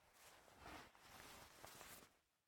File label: Player Wakeup Stay; MiSide